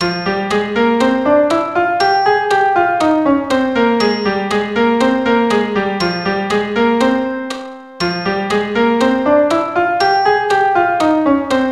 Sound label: Fm melodic